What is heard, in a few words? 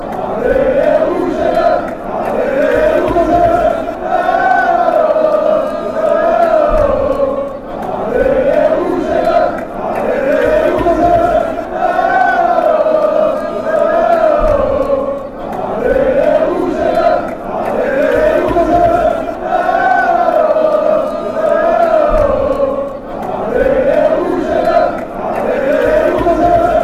allez red and blues, chants